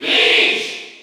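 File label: Cheer French, crowd; Peach; SSBU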